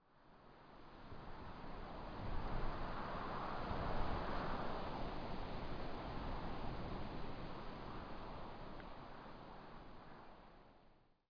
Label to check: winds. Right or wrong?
Right